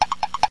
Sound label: woodblock